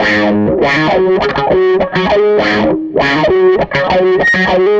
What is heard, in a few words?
Tele Guitar Loop